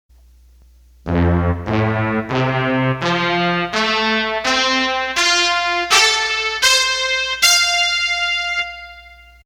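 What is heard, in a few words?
Synth Brass, Roland accordion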